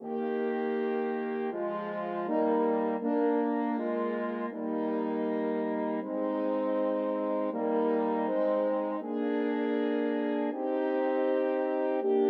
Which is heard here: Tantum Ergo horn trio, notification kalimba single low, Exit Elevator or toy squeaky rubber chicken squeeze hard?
Tantum Ergo horn trio